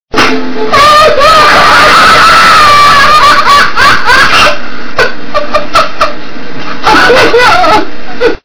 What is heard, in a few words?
crazy laugh